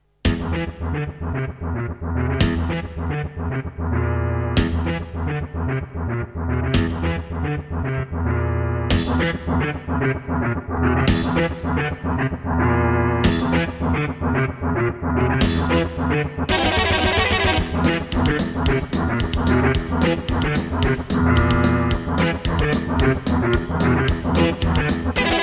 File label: Creative Sound Blaster AWE64 Gold; Doom